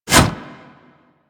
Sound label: Melee Block Stop; Warframe; Wraith